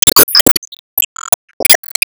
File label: Sp Root Acidfloor